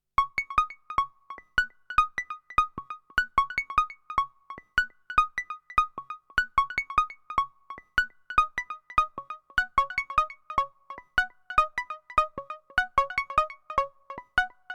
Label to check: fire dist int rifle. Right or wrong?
Wrong